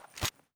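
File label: weapon foley m4 reload end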